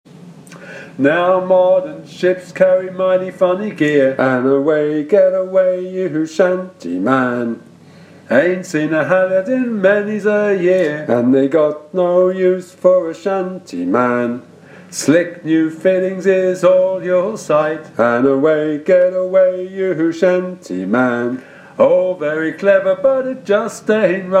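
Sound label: Shantyman - bass, sea shanty teaching track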